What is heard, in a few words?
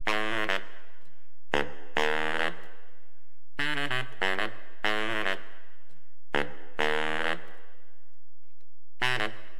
BARI, Acid Jazz Funk, Wind; LiveAct Pool